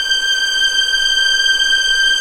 G6 EXP MF, VIOLINS; East West; The Ultimate String Collection

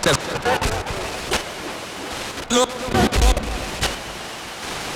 Random Delayed radio Waves

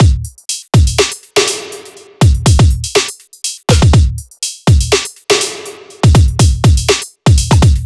122 drum loops; KnockKnock; M8; Electronisounds

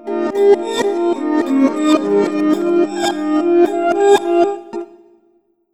SSTRINGS, REVERSE FX; USB Soundscan vol.60; Asian Traditions; AKAI

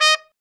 TRUMP 1C; Trumpet; Sound & Vision - Gigapack II CD 1

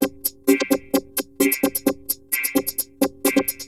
Cylon Beats (130BPM); sci-fi

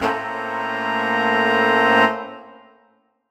Horn Swell Amajminb6; undercover samples

